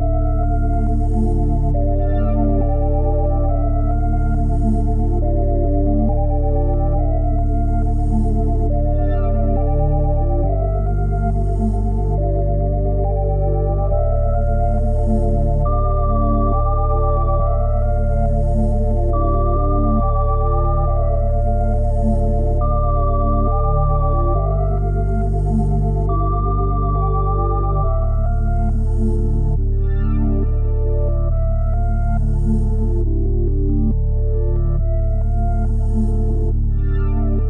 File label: 138 BPM D Min, Melodies; Wanted; Cymatics; Immortal Production Suite Beta